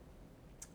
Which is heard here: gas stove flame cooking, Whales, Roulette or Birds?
Birds